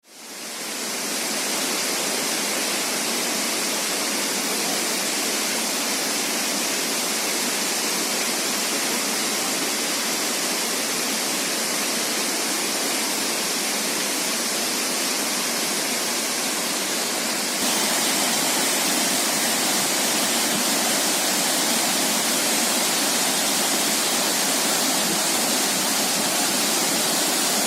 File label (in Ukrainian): vodospad uchan su u krymu